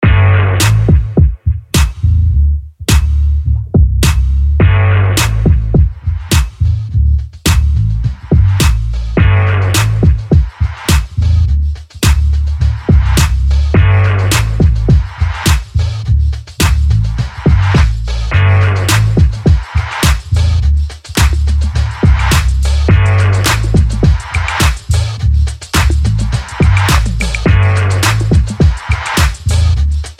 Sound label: the wall 105bpm